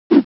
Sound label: banshee confusion fire